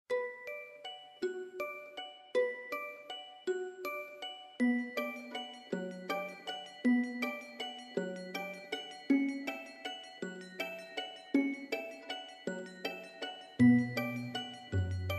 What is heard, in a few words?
Valse, string quartet